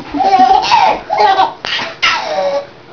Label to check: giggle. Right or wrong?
Right